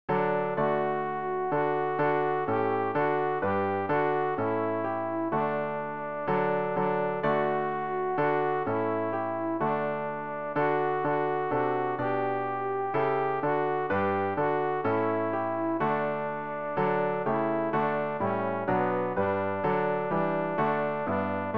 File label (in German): alt, Übehilfen für das Erlernen von Liedern; die gnade unseres herrn jesus christus